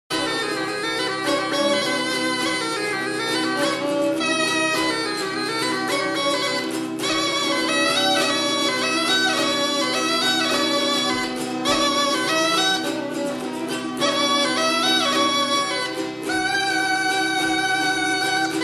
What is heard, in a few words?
traditional music